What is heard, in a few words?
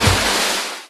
Splash; Adventure Time - Gate Crashers